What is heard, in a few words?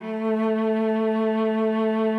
A3, cello vib; Xsample VOL-7